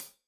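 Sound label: hat closed, hi-hat cymbals stereo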